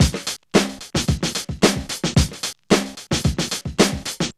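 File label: 111 bpm, Funky loops; Zero-G; Funky Elements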